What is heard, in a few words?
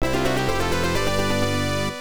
Treasure Chest; popful mail; pc 9801; opna